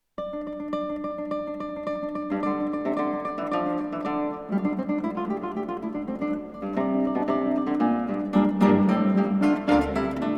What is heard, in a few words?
for 2 guitars & orchestra, Entrada